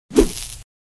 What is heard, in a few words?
stunstick swing, weapons; hl2